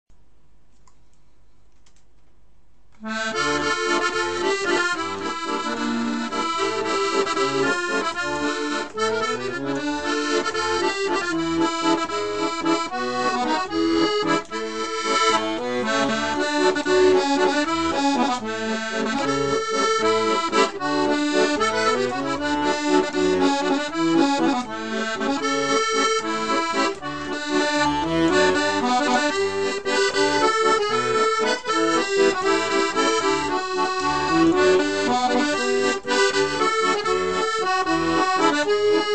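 Zvuchanie muzykalnyh instrumentov Akkordeon, sound of musical instruments accordion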